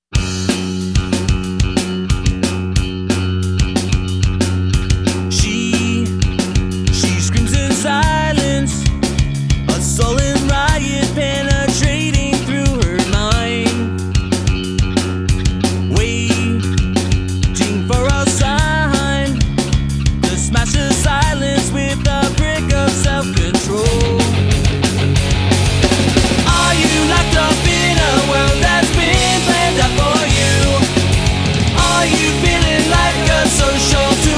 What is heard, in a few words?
Tribute Band